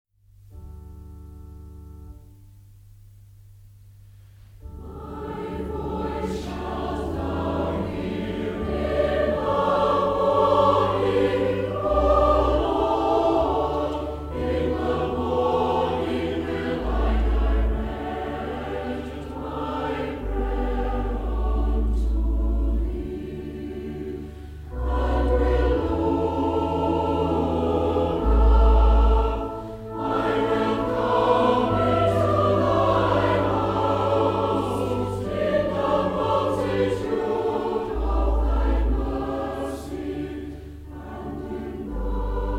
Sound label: my voice shalt thou hear, Northern Choir